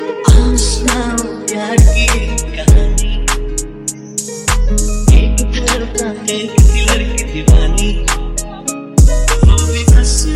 Pop Punjabi Ringtone

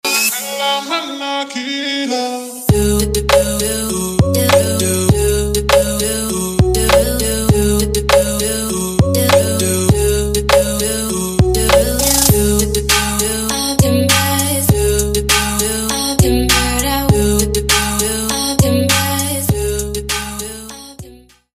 English Background Music

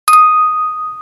iPhone 11 Pro Sms Ringtone

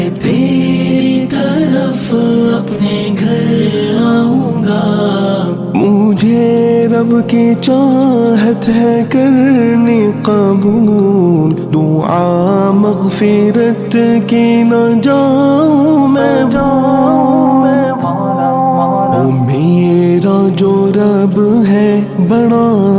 Islamic Ringtone

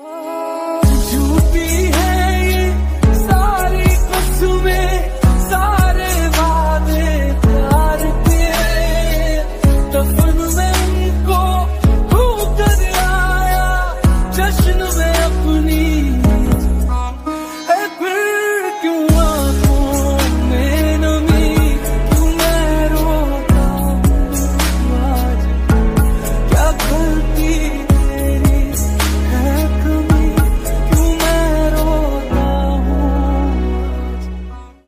Hindi Ringtone

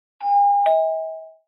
Doorbell, Huawei Ringtone

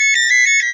Notification Ringtone; Vivo Y20T

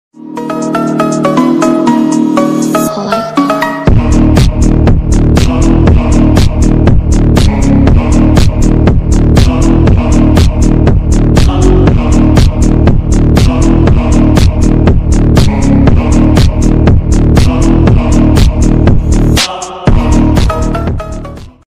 Rave

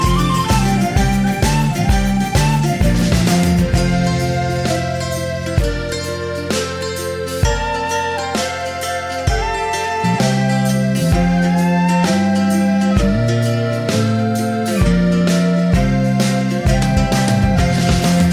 Cartoon Theme For Smartphone

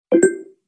Android 7 Charging Sound; Sony

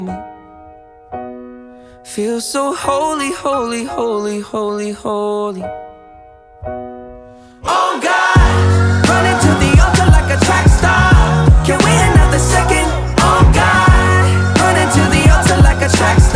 English Tone

